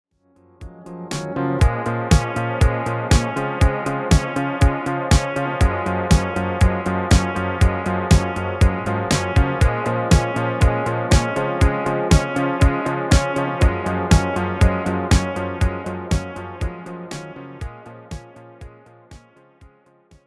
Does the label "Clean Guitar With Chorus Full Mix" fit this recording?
Yes